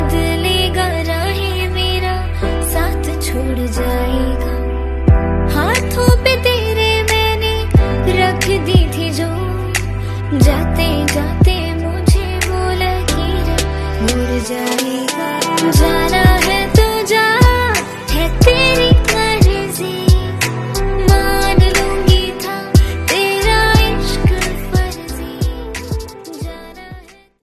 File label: new song ringtone